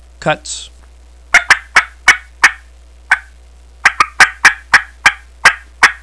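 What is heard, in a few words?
cutts, calls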